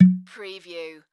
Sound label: Cork Pop Open Half